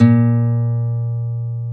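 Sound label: GUI NOTE, acoustic guitar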